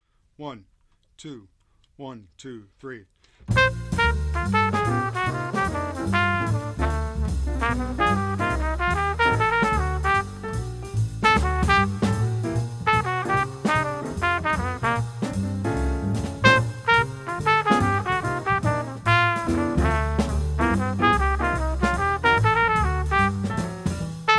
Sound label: Amazing Bud Trumpet